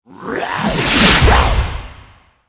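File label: attack, monster; mutroop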